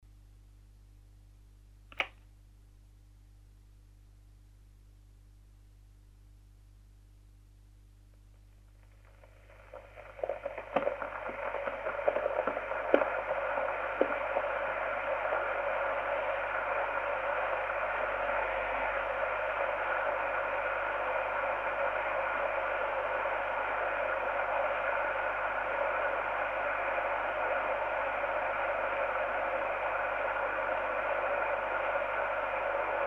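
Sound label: sound of kettle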